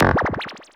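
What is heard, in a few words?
fx laser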